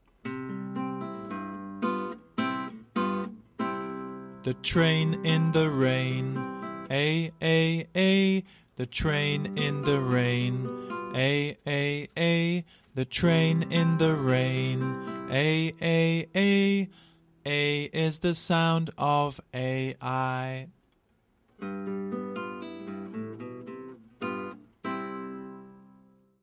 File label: chant; paint the train